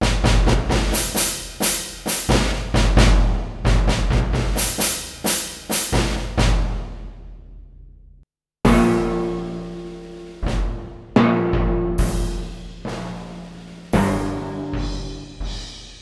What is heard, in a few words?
orchestral perc; kurzweil pc361